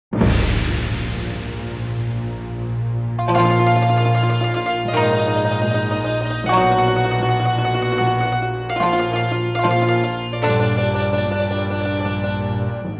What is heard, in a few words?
Chinese Ambient; music